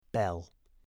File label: bell